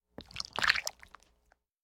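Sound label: Slime Pour Short Light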